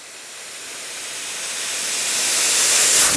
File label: composite reversed, tire; gmod; svmod